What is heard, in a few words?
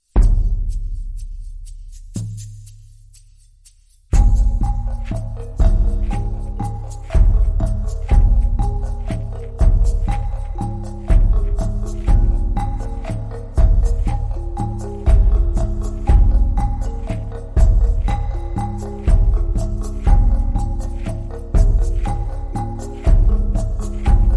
Slow Savannah Rhythm